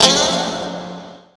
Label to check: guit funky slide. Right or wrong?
Right